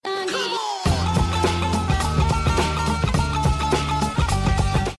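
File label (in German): Instrumental SMS Ton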